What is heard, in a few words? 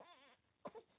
crying, baby cry